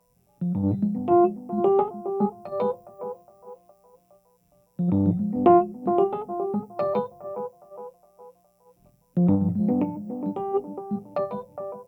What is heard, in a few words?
Rhodes, G; Lost in Space